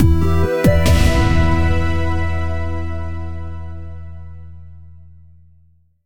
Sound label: Victory Stinger; Legacy of the Elder Star